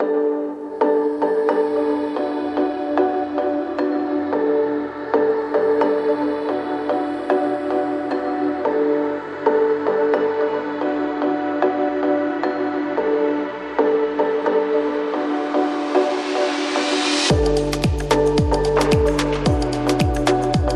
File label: electro dance